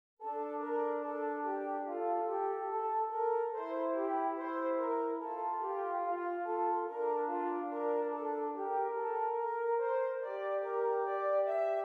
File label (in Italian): Esercizi di contrappunto a due voci; dritte Gattung; Ronge pagina 59; variante prima